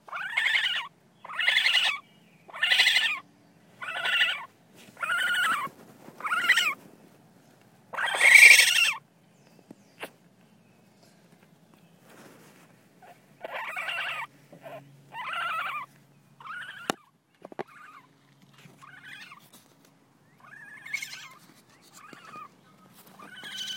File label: Raccoon Baby Sounds